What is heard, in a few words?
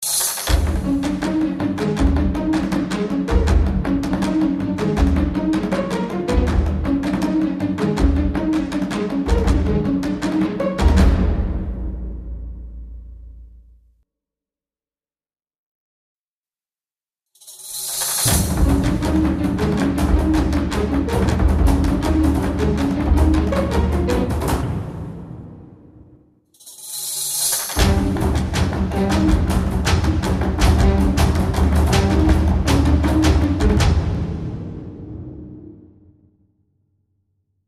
orch stop go